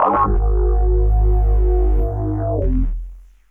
SYN, Drum & Bass, 170 BPM; Ueberschall; Drum & Bass Flash Trackz

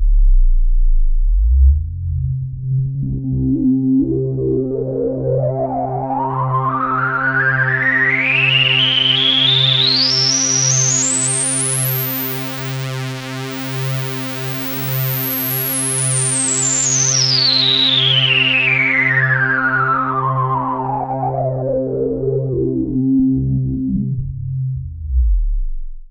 FX SWEEP

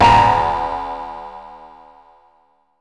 BUZZ; Masterbits - Total Zone

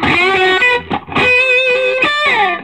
SOLO, Vintage Blues Guitar; USB Soundscan vol.22

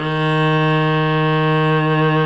B1 SOLO CELLO; Northstar; New Gold VOL-2

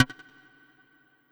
PERC, Drum & Bass, 160 BPM; Ueberschall; Drum & Bass Flash Trackz

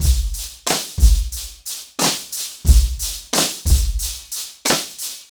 90BPM, acoustic drums; The Roots Drumloops

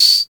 CR78 drum machine; Roland L-CDX-01; Cheese

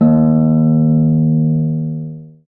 STR HARP, STR Orchestral

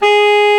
ALTO SAX G#4; Greytsounds VOL-1

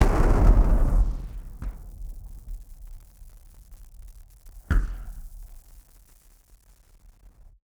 Party Popper FX

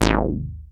ARP 2600 G#2, synthesizer; Hollywood Edge - Giorgio Moroder Rare Synthesizer Collection

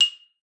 F6, xylophone; Xsample VOL-4

